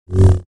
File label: Saber; FXHome